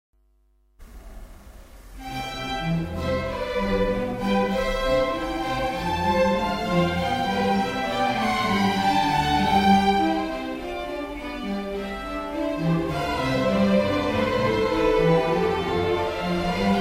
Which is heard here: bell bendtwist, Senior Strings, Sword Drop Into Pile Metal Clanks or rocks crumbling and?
Senior Strings